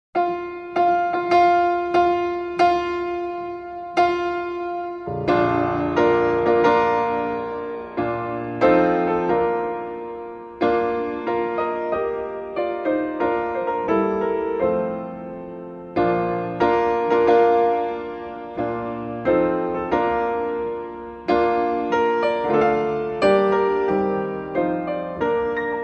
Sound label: Here comes the Bride Piano